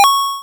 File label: Retro Coin